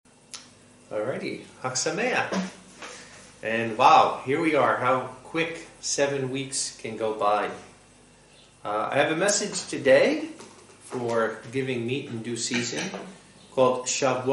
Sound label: Singing; Shavuot And The Latter Rain; sermons